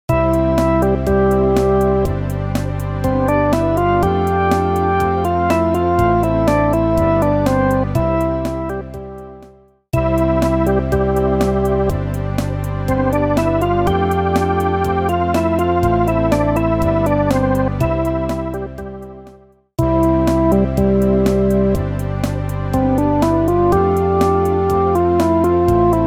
hammond

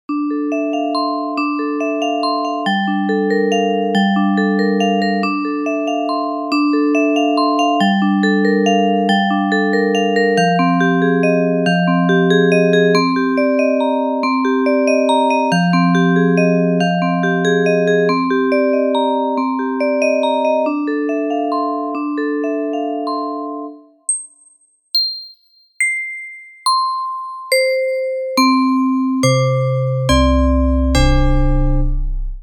Glockenspiel, struck instrument simulator

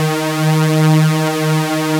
supersaw

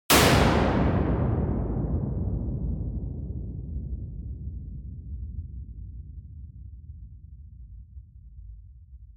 Noise

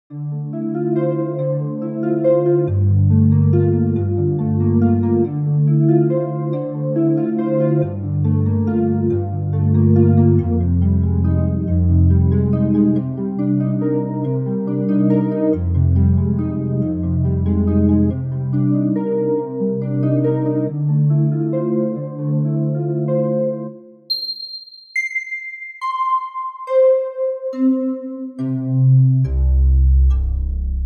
Noise Harp, struck instrument simulator

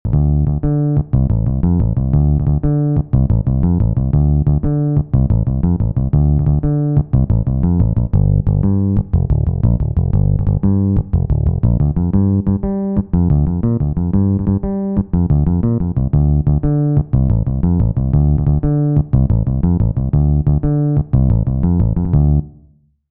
bass

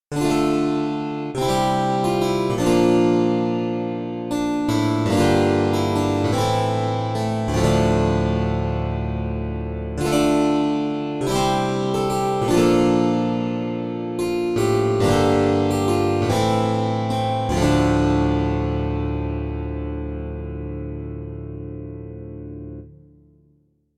harpsichord

